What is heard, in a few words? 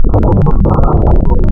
VIRUS, wavetables, Classic Synths